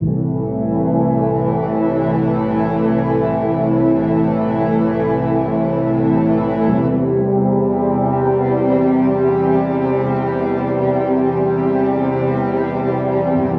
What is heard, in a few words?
tone, resounding